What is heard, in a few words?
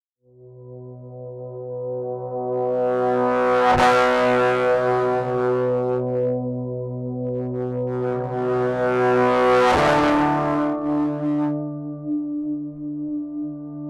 reverse tail guitar solo